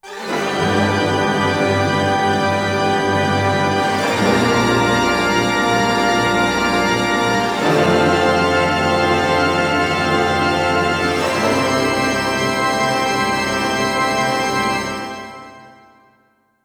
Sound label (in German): Orchester Auftakt Jingle